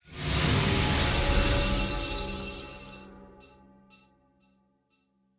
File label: Champ Crypt Reveal Burst A; Champion Crypt